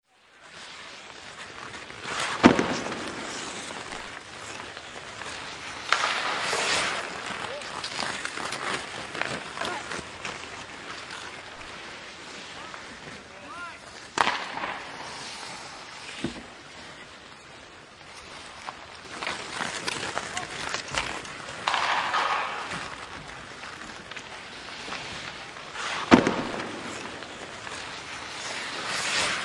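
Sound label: hockey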